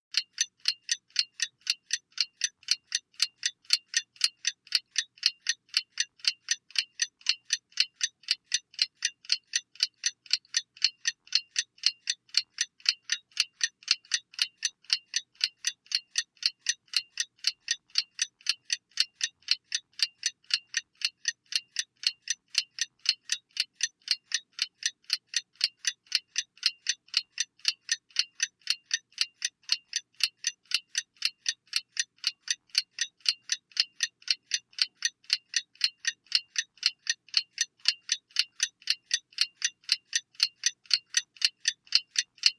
2 Bell Metal Alrm Cloc, Alarm Clock; Analog; Rings, Ticks